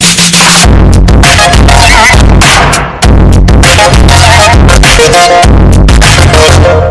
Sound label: dance but sounds better; fortnite